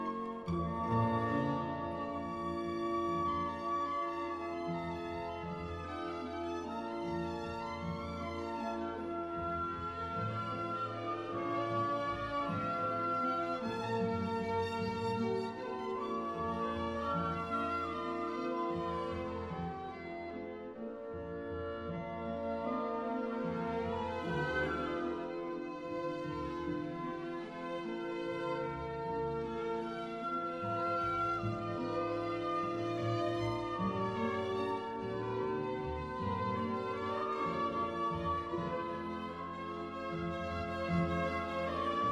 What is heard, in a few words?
Symphony 9 In D Minor; Beethoven; Norrington